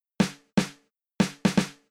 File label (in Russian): snare, Ритмическая фигура малого барабана 3; для 4-го такта